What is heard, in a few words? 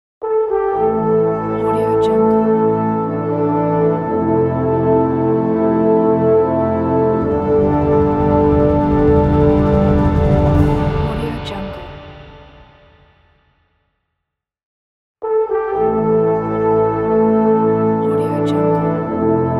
independence day ident